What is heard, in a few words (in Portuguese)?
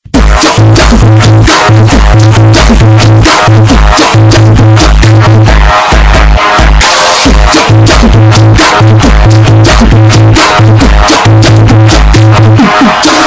funk altomotivo, Músicas Com Graves